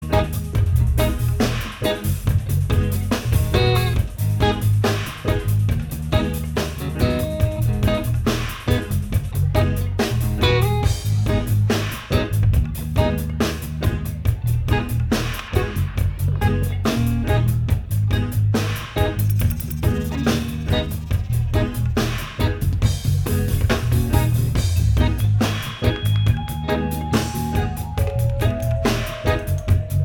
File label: Improv Jam